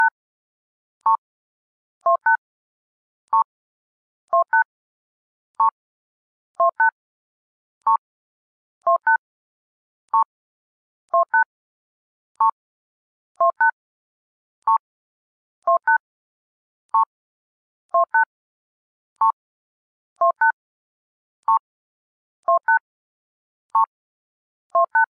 Key Pressing

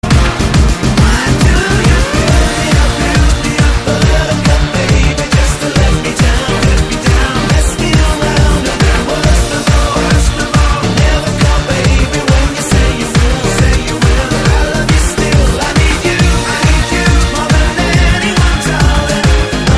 remix

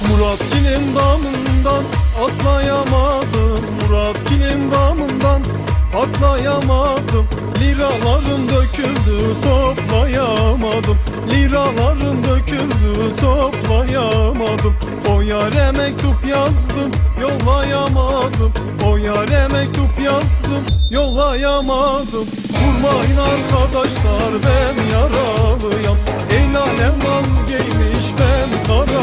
Turkish song

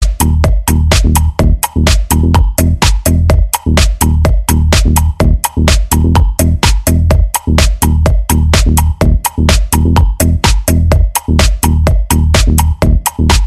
House

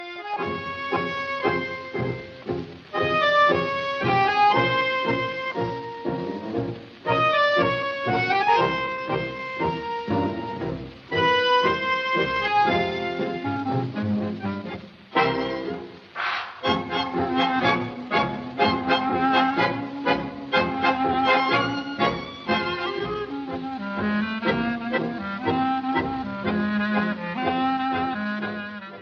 Retro

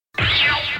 laser; Evangelion

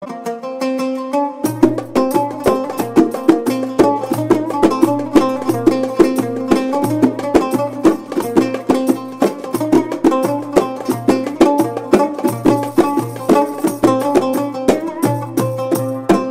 Arabic Ringtone

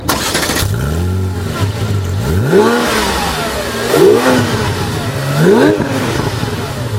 Porsche 911 Gt3 Rs

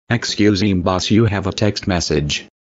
Msg Tone

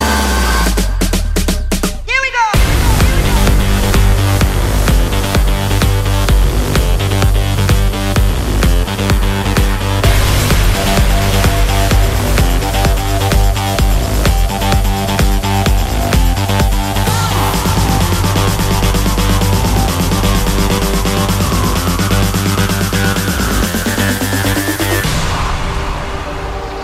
Hard Rock

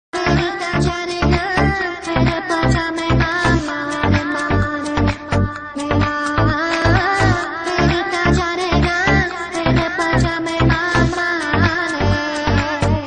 Indian House Style

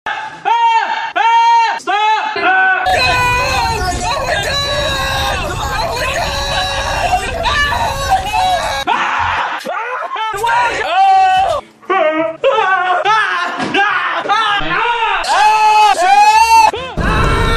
screaming

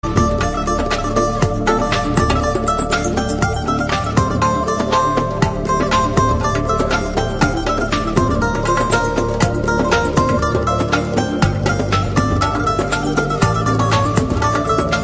Instrum

